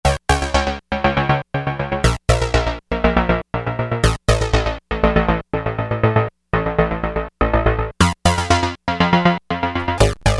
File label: Bassive, Novation XioSynth; Novation XioSynth 25